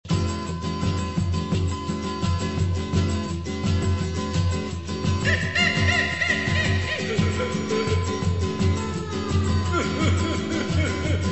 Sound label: First Chord